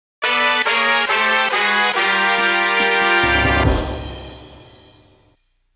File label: Race Goal LOSE; Snowboard Kids; Super Snowboard Kids